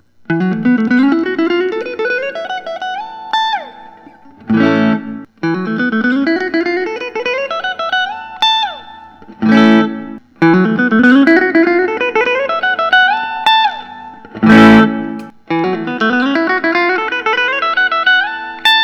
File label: Fender Custom Shop American custom